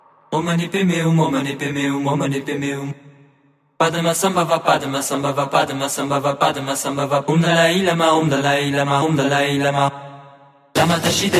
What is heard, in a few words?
Psy Trance